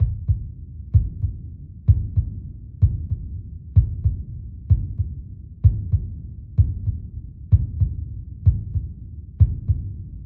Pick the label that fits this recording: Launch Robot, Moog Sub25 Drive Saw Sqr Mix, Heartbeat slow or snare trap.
Heartbeat slow